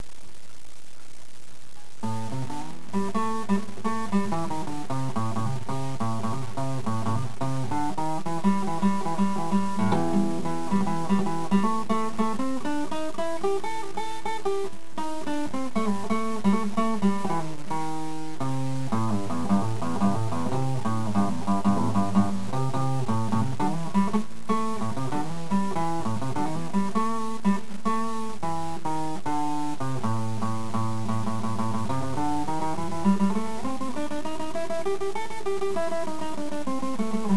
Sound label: one minute blues scale; guitar; the microphone in my laptop computer